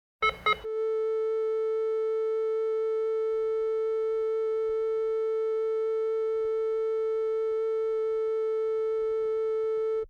Hospital effects

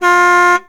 Mustang GT350 Horn; Automobiles

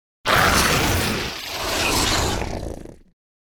Growl Beast Die - Monster Growl; Hollywood Edge; sound designer's toolkit v2

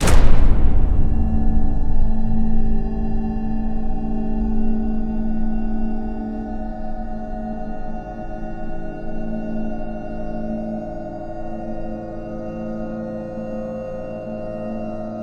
sting, Cinematic Metal Impacts; boom library

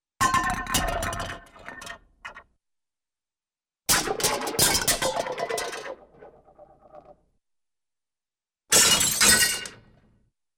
Aluminum Cans Fall & Roll Glass Shatters, cartoon; Hollywood Edge; Cartoon Trax

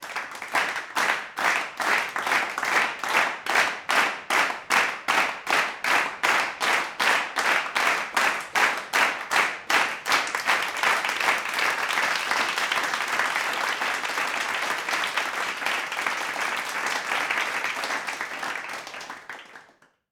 Clapping Crowd Studio

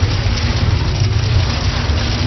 Roll Metal Barrel Loop; SFX Kit; Foley - Misc

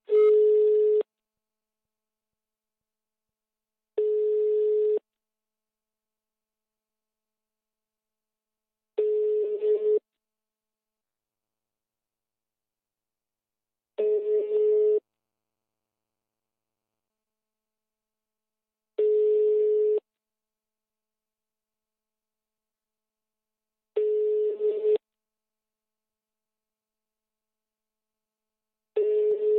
phone ringback tone; devices accessories utilities; NS Studios - ultra sound collection

